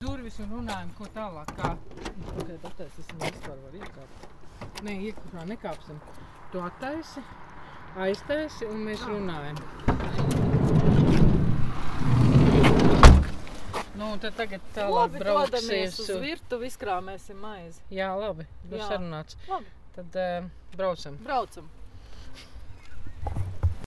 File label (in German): Atmo Besprechung am Boni-Bus